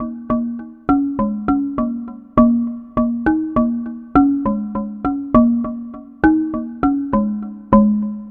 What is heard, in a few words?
Marimba Low; Ambient; Melodic; Ambient-Melodics